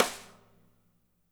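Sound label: medium snare; DRY KIT; Spectrasonics; Burning Grooves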